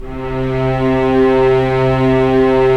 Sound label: STR SC, Roland LA Composer; Roland - LA Composer vol. 4; Full Sec